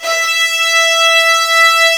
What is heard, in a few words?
Virtuoso Strings